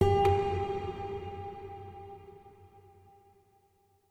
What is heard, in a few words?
Chime; chime sharp